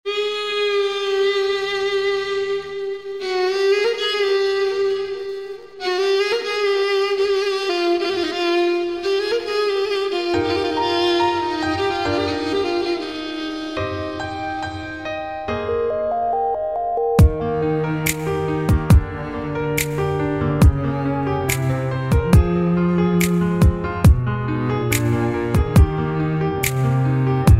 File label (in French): instrumental